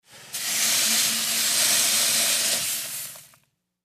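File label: Detergent Powder Pour, kitchens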